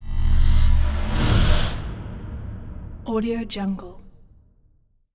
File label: bloody nightmare zenith stingers; soundmorph